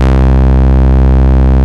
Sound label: synth; bidule